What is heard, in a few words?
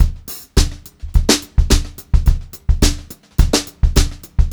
HOTROD BEAT, Brushed Drum Loops; USB Soundscan vol.39; AKAI